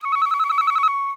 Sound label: musical flute alert